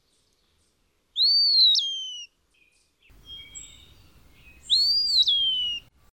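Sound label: Pewee Eastern Wood Song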